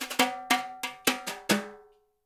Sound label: BRUSH TIMB; Spectrasonics; Liquid Grooves 1 & 2